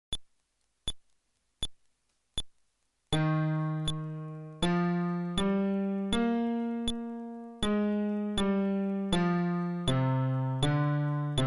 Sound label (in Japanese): フレットレス (テンポ80)